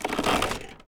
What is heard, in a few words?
swing strain generic